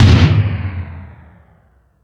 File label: Toms, Drum Machines E-MU Pro-Cussion